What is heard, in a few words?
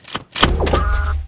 toggles on; p00gie